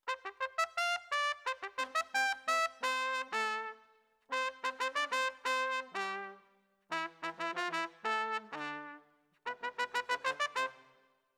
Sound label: Trumpet